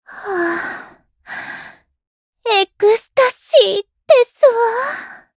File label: applause